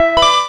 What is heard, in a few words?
KEY Hold Loop Start; NYC